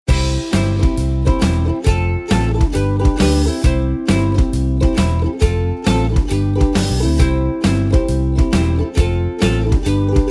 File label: game bgm